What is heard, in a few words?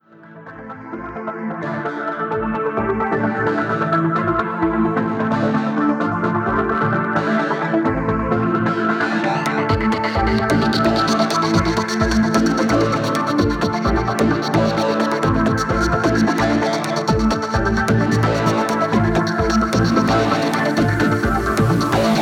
Funky Futuristic Breaks mix